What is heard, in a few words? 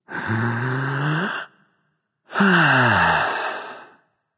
nv breath, vox; smite; voicepack; vulcan